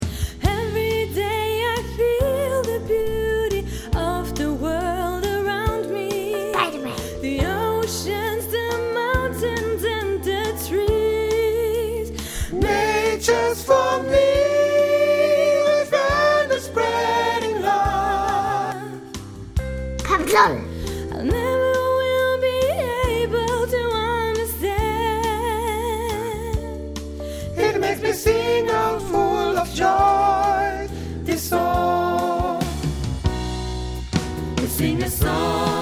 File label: verse backings sopran